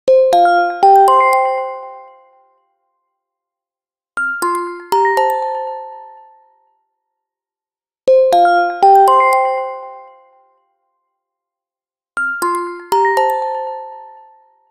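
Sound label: Ring, ringtone; Samsung; GT-i8700 Omnia 7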